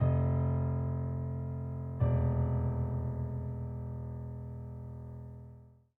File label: Theme Fm F E; DeadFright